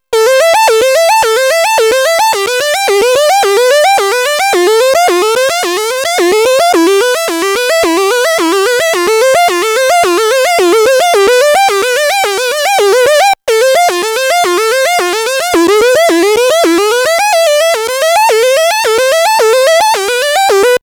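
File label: synth lead, novation bass station rack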